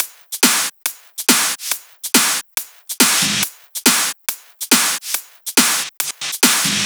drum Loop 140BPM basic; Vengeance - Essential Clubsounds Vol.5; VEC5 Drumloops; VEC5 Multi Loops 140BPM

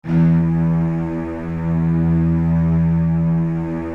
Virtuoso Strings, E1; ILIO; Kirk Hunter Virtuoso Strings VOL-2; 90 s Sample CDs

